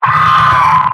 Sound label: Pyro pain severe